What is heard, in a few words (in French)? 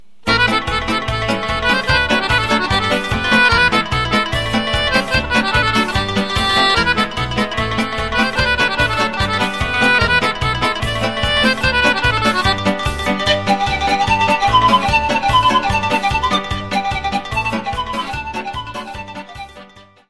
Dance in Muntenie - Roumanie